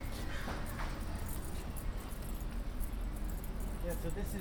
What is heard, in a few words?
Crow And Jingling